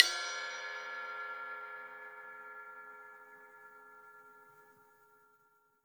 RAW RIDE, Drums Acoustic; USB Soundscan vol.10; AKAI; 90s sample CD

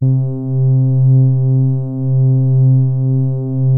MOOG HARD C2, LEAD; Music Store - Claudius Diemeter Schwerlos

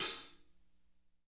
hat slosh

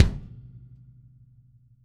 KICK L

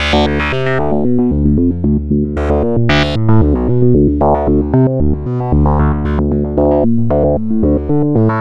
Synth Bass

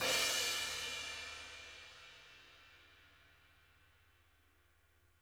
crash, Drum Kits; Sonic Reality

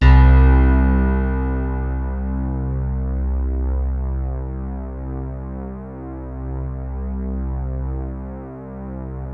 BALLAD C1, E-PIANO; Music Store - Claudius Diemeter Schwerlos

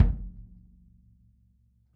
kick, Drum Kits

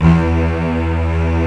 Section String E1; Proteus 2000; Multisamples; Digital Sound Factory Proteus 2000

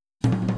Boogaloo Chorus Tom Rack, 82 BPM

